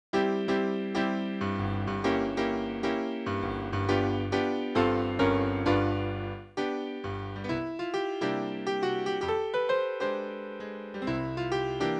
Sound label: santa coming piano